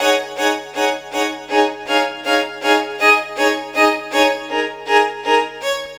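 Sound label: Ds string quartet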